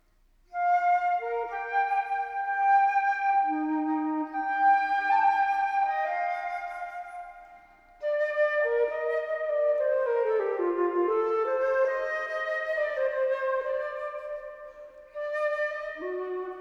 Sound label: Crystal Flute Ringtone; Teleman Fantasia 4